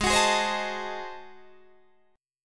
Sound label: Ab 7th Flat 9th Guitar Open D, chord